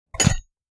Metal fire extinguisher put down

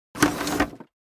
Sci fi gizmo mechanical movements

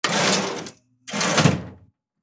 Cash register drawer open and close

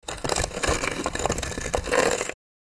Werewolf claws scratching surface

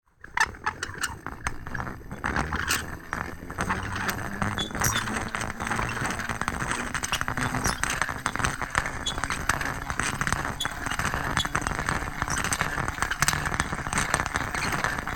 Knife sharpener rotating; hand tool

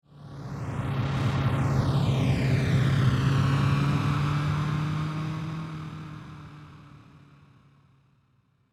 Car passing by slow

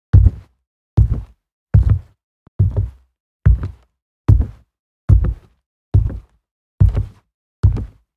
Footsteps on wooden floor boots walk

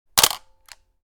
Camera shutter click taking picture

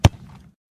Soccer ball kick street soccer

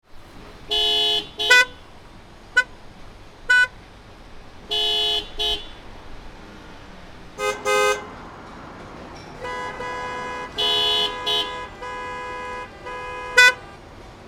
City ambience street busy traffic car horn

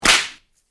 Whip crack impact

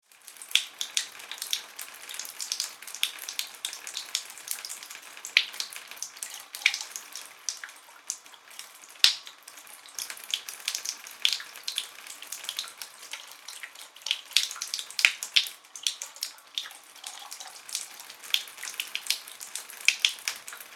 Shower running inside low water pressure

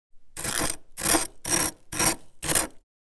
Ice scraping frozen ice block

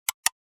Infographic button

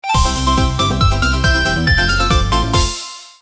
Free spin reward casino slot machine

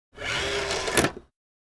CD or DVD inserting a disc into player

